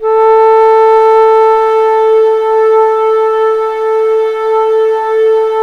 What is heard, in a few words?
ALTO A3, ALTO FLUTE; USB Soundscan vol.23